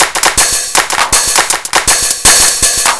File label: logo rap